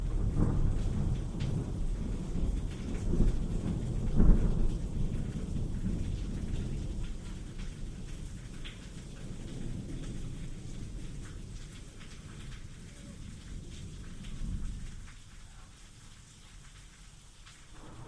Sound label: Thunderstorms